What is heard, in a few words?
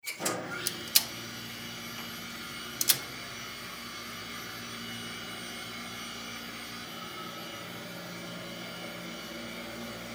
elevator old style